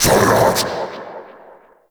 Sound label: Uriel Brimstone Cast Vocal; Warframe